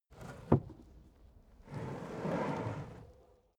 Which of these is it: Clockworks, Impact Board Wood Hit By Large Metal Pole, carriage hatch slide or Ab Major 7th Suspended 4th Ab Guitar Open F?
carriage hatch slide